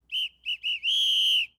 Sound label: Full Time Whistle; Championship Manager 2006; XBOX